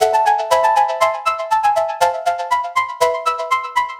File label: flutter; ultimate vital bundle; rocket powered sound